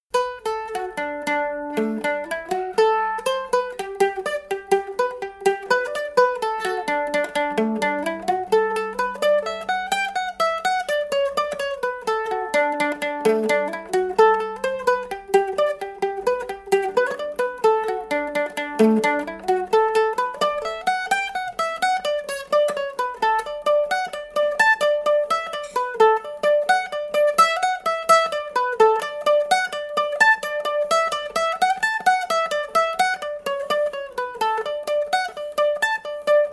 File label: banjo